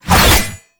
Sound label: Bayonet Melee Combo; Warframe; Tenno